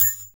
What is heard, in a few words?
XTRA PERC